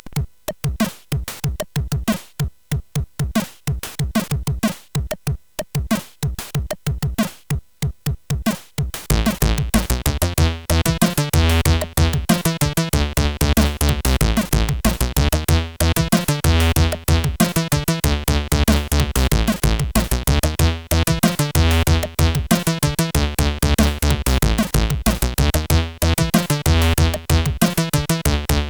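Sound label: Arabian Song, MOS 8580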